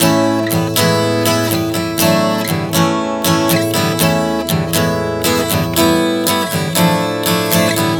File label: A-E-D-A Em-Dm 120 bpm, acoustic guitar chord progressions